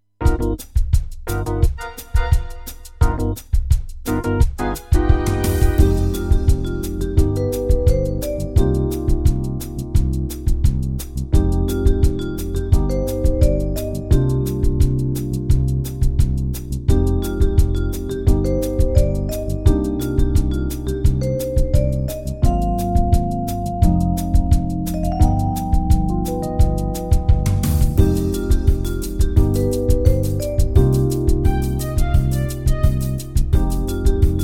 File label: Bossa